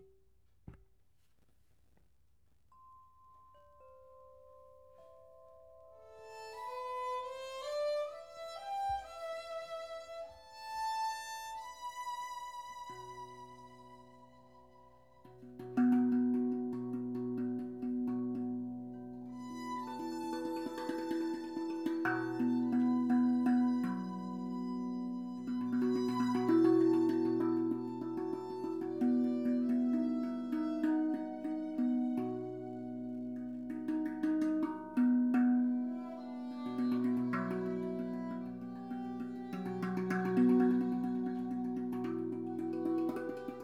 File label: Violin